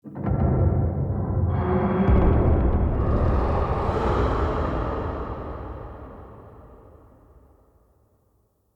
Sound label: Stone Int Puzzle Activate Far; Puzzles